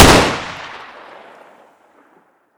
m4a1 unsil; weapons

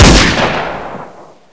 deagle; weapons

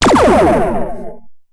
photon torpedo

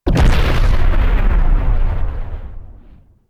explosion big, heavy bomb; explosions

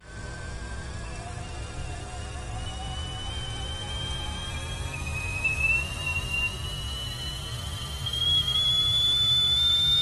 kettle pressure buildup; explosions misc

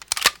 psg 1 bolt pull; g3sg1

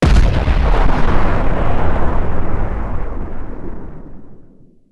mine explosion; explosions; light bomb

